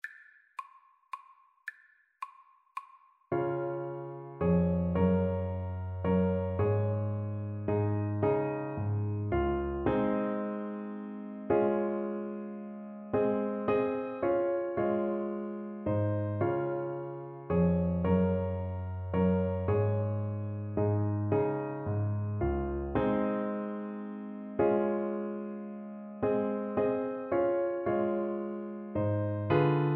mozart minuet, Saxophone

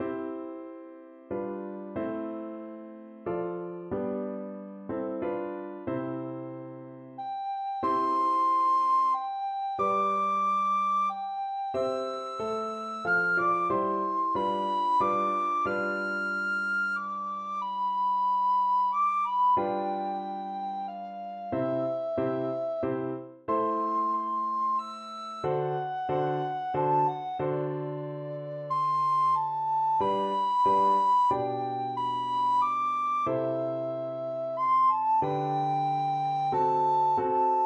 isis und osiris, recorder

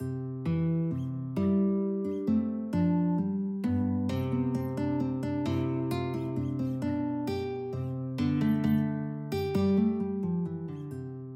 minuet, guitar

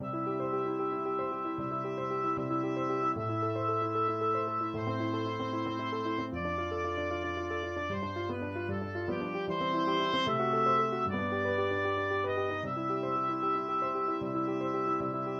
Schubert Op90 No3, oboe

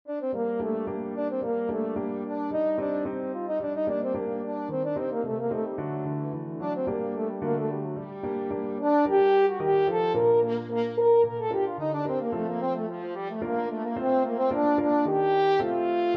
princess royal, french horn

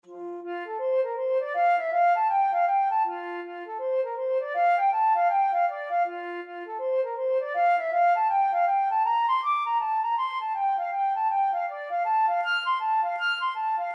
flute

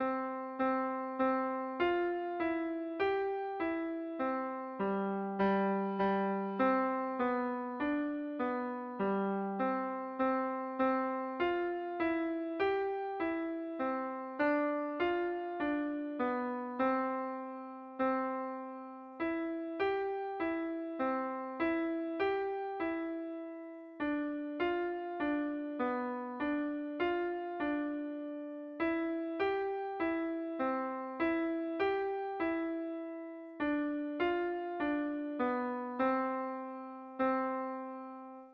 bobby shaftoe, piano

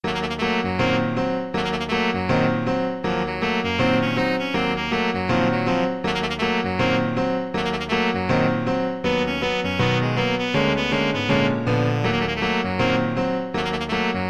saxophone

